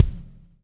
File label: SLICK, Backbeat